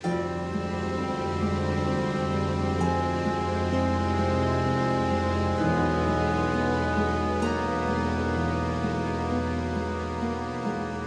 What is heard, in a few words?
Exploration Music; the lord of the rings return to moria